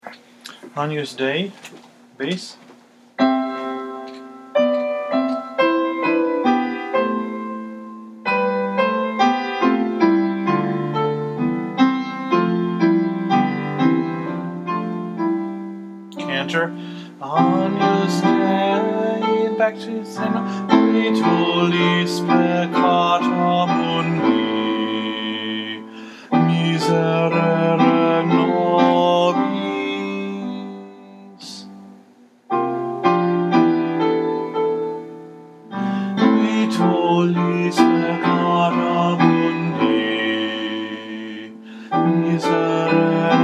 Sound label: bass; agnus dei mass xviii